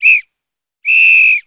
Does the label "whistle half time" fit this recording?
Yes